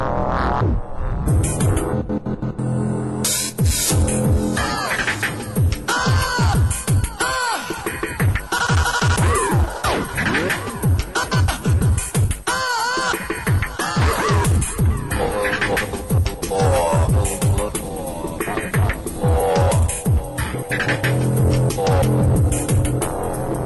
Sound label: crazy ringtone